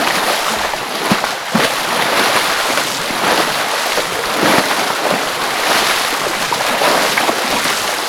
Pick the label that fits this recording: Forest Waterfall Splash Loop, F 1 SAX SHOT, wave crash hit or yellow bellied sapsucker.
Forest Waterfall Splash Loop